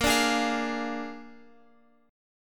Bb 7th Bb down Guitar Open F; Bb7 strummed

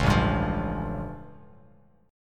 A Augmented 7th A Bass Standard

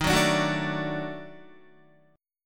Eb Minor Major 7th Flat 5th Eb down Guitar; EbmM7b5; strummed; JGuitar

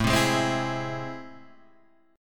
A Minor Major 7th, down, Guitar, Open G

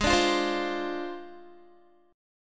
Ab 6th Flat 5th down Guitar; Ab6b5 strummed; JGuitar

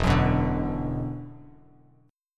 E 7th Suspended 2nd, down, Bass Standard

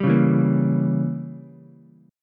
G Diminished 7th, Ukulele; strummed; 0,1,0,1; JGuitar

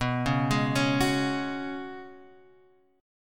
C sharp 7th Suspended 4th B

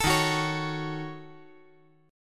Eb Minor 7th Flat 5th, chord; strummed

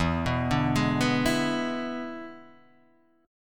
E 7th E down Guitar Drop D

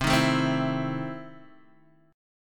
E Major 7th Suspended 4th Sharp 5th, C

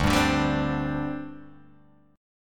D 7th Suspended 4th, down, Guitar, Open G

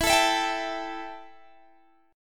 E Minor Double Flat 5th E Guitar DADGAD

